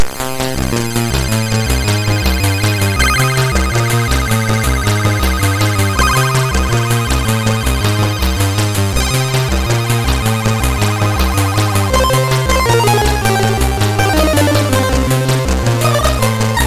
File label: Elevator Action, MOS6581R3